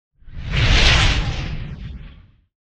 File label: Epic Swishes; SwooshSounds-2